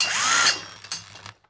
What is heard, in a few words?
metal construction; Rust